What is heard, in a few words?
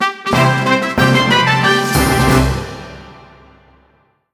Icon Jingle; Team Kirby Clash Deluxe